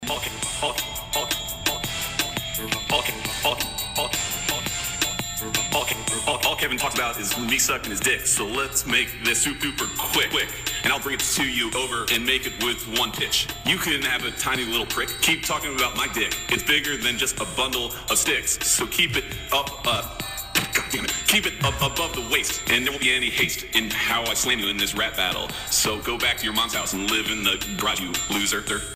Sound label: rap